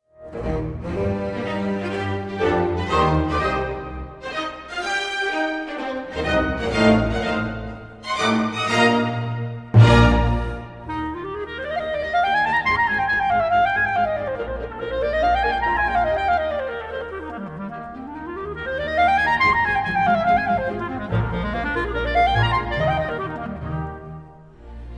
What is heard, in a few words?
scottish chamber orchestra; maximiliano martin; carl maria von weber; clarinet